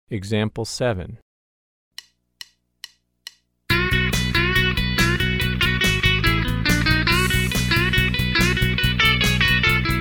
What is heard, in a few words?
Country Rock